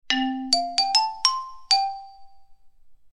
Marimba instrument sound

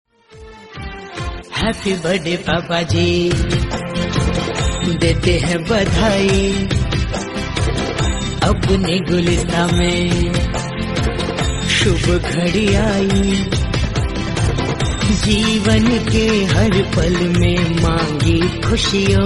Happy Birthday Papa Ji Hindi

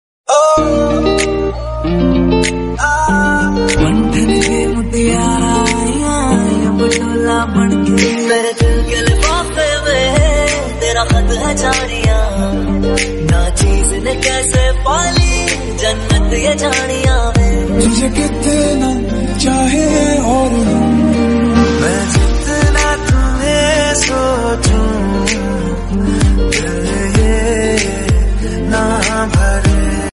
Hindi song about anniversary

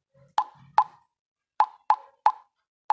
Tick Sound; Whatsapp

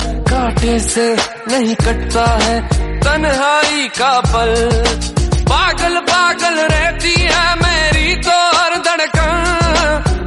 Music

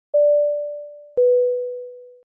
Ding Dong Sound Notification

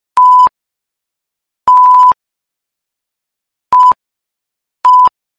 Censor beep sound effect

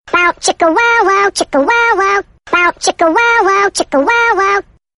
Bow chicka bow wow sound

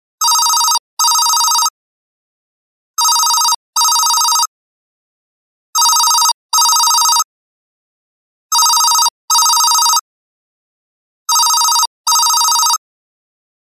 Digital Small business phone system

